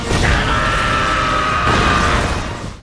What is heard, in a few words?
PUNCH; ALL MIGHT